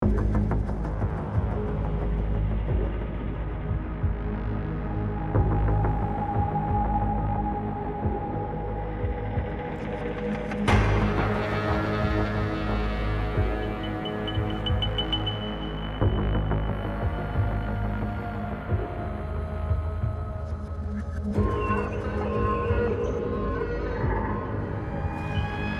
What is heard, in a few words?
Exterior Music Ambient